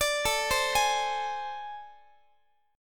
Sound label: A Minor Major 7th Double Flat 5th, Guitar; AmM7bb5; arpeggiated; chordshape; x,x,17,19,16,19